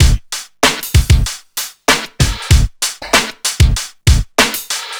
96 bpm breakbeat sample g key, Free drum groove - kick tuned to the G note. Loudest frequency: 2208Hz